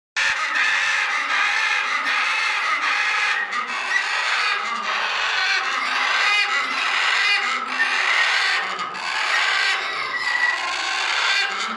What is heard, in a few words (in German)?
Aras vor dem Frühstück, Achtung, sehr laut!!